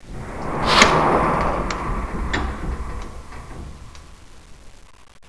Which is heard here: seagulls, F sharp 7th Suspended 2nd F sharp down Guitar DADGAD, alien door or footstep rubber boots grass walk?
alien door